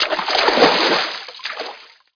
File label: SWIM IN; OUTLAWS